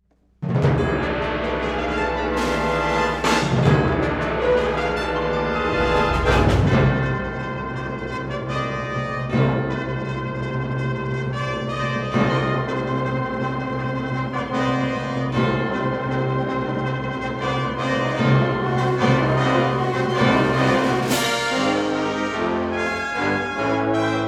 fanfare

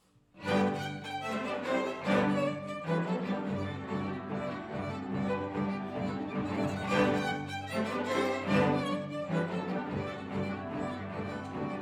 russian dance

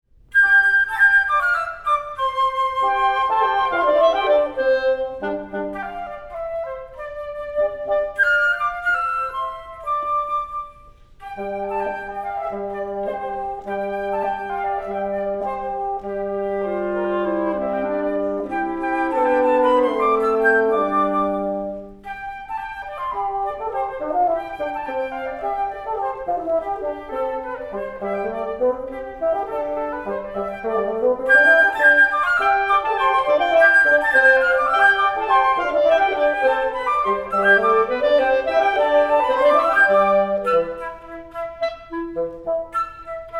suite for woodwind quartet

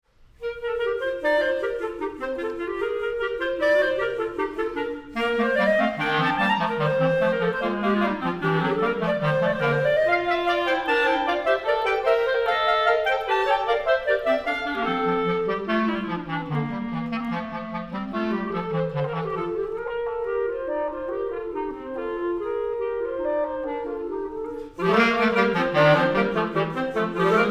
quartet gopak

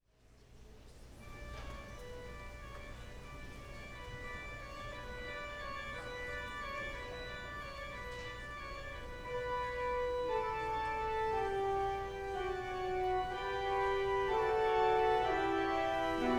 carillon ukrainian carol

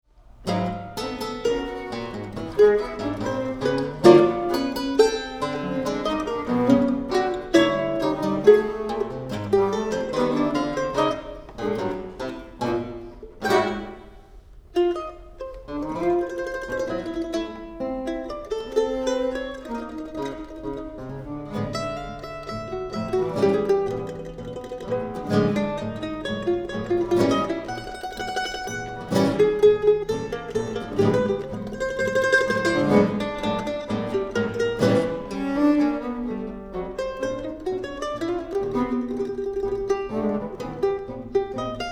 tango

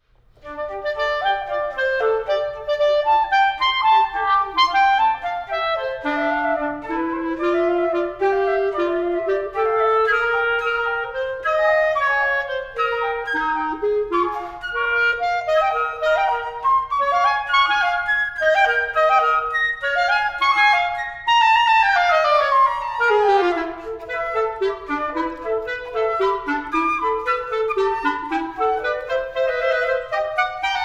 duos for flute and clarinet

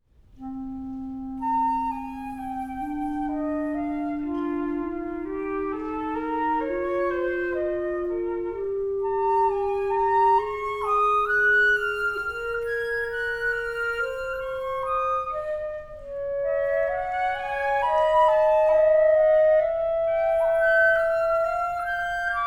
duos for flute and clarinet

